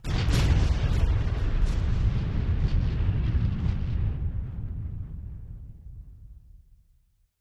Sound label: MULTI EXPLOSION